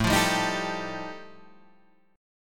G 7th Suspended 2nd Sharp 5th A chord

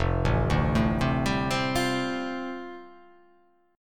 F 6th Add 9th F down, chord

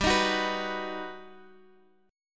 G Augmented Major 7th, Guitar, Open G; x,12,13,11,12,x; down

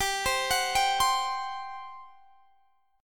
G Major 7th Suspended 4th; GM7sus4; arpeggiated; x,22,22,23,20,20

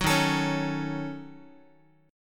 Eb Minor 7th Flat 5th, Guitar; strummed; chord; 9,11,12,11,x,x; JGuitar

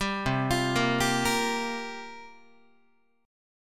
C 7th Suspended 4th, Guitar, chord; C7sus4 arpeggiated

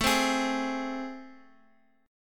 A Minor Major 7th A, Guitar, Standard; AmM7 strummed; x,x,x,2,1,4

